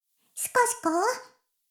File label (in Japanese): シコシコ音声; 古き城のドラゴニート2